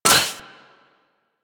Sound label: Shock Rifle Low Ammo Mech